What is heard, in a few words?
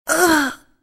death, female; csco; csgo; zbm3